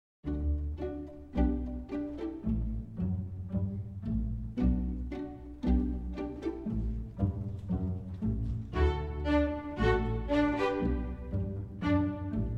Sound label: wind rhythms; kendor music